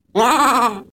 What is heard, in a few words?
Goat